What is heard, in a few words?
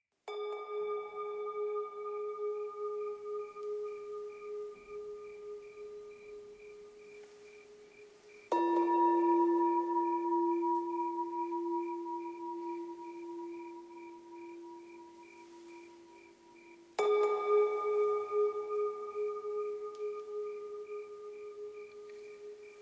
Tibetan Bowls for Midnight Yoga